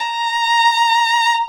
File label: violin